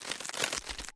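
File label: attack, ice snow insect; monster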